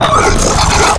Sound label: vox abandon gurgle, zombie